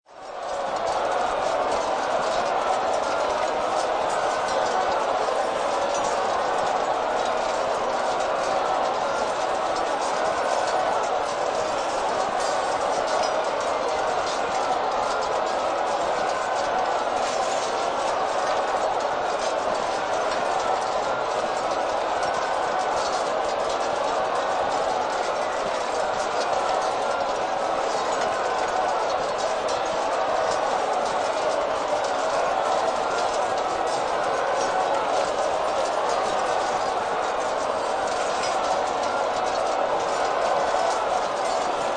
Warfare Medieval Battlefield Weapons Horses Soldiers Distant